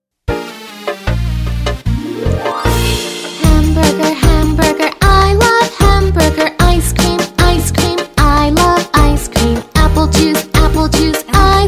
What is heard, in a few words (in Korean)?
영어 여 노래